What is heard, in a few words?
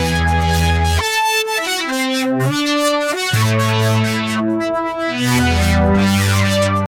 edm style synt